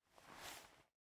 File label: Player Move Switch; Game rip; MiSide